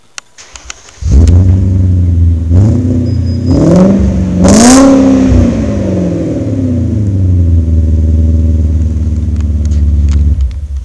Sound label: magnaflow